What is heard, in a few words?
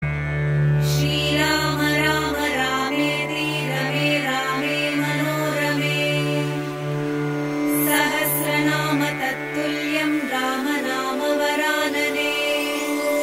rama chant